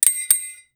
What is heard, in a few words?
office